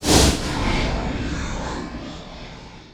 dagger cast; spectre

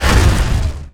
attack; weapons; dota; lion

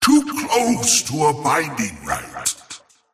teleport pain; razor

